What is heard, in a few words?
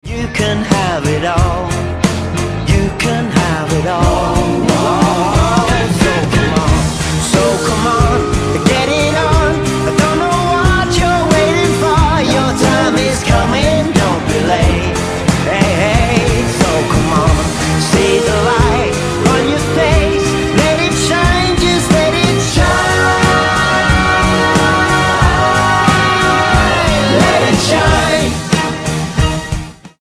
Ringtone